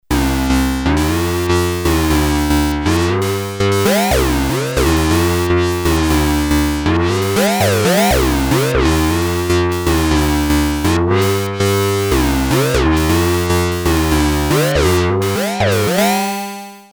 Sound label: synth fat, fat bass; synth glided xmod; Polivoks formanta; a lot of HIGH FREQUENCIES here